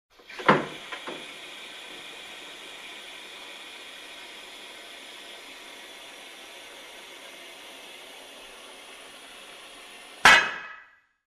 Industrial Machine clank

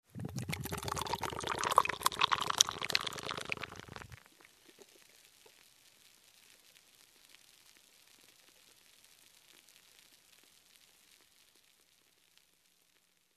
Soda Pouring sound

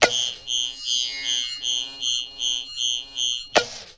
Electronic System Turn on and Off

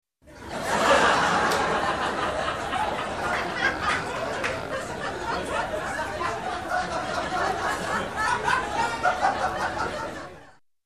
Audience in a room laughing

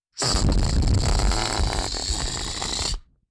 Blowing in microphone

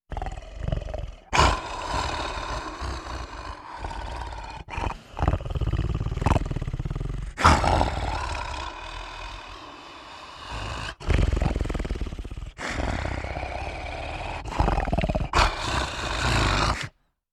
Wolf like creature growling

